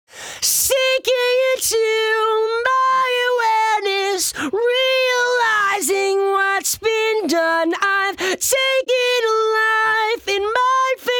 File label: lead voc